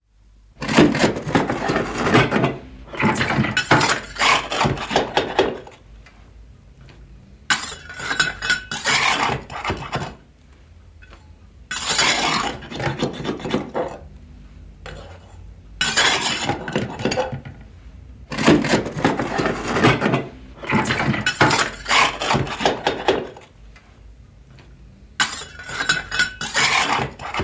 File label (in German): Asche schaufeln Kamin; Geraeuschesammlung; BBC